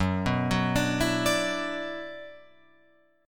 Gb-7th Suspended 4th Sharp 5th-Gb-6,6,6,9,7,9-8-down-Guitar-Open F; arpeggiated; JGuitar